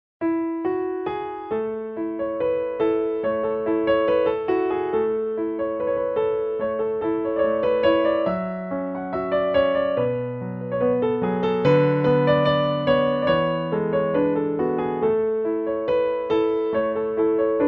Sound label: Reel à Robin slow